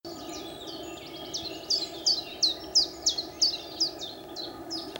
canto territoriale phylloscopus collybita common chiffchaff; lui piccolo; zilpzalp; bioacustica